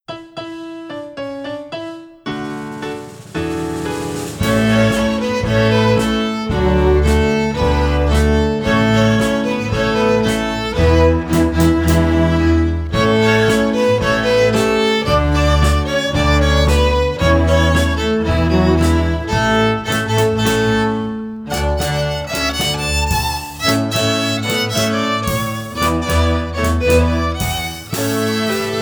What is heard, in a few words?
Jazz Suite Simple Syncopation